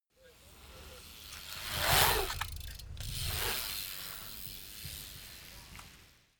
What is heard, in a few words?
bmx maneuver pipe in and out